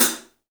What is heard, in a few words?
3D Drums, TIGHT ZILDJ; 3D Sound